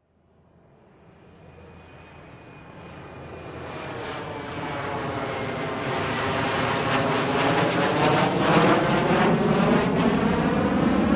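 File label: Plane Hawk Far overhead Low Jet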